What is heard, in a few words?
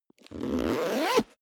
zipper backpack close accelerate